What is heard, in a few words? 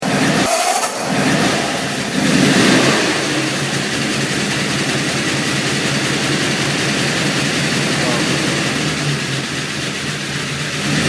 1936 Ford Pick Up